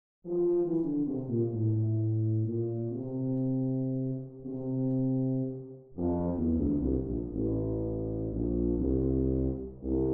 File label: tuba